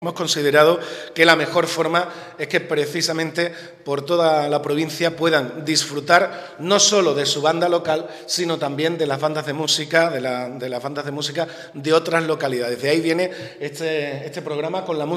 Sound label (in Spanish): bandas de musica